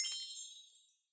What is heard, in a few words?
bell